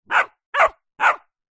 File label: animals dog puppy several weeks old barking ridgeback cross bullmastiff